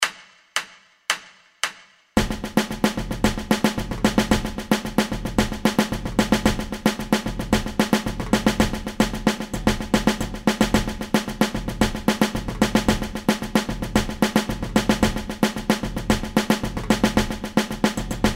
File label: Levada Caixa, samba